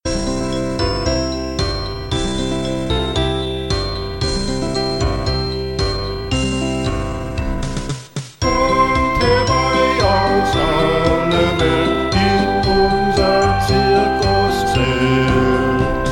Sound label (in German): zirkuslieder kommt herbei kanon voc